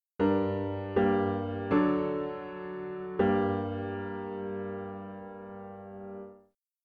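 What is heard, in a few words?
piano, dictation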